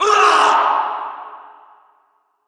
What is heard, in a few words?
scream